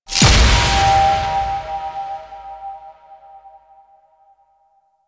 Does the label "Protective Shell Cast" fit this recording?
Yes